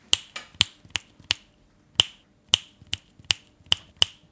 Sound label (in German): Geraeuschequiz Schnipsen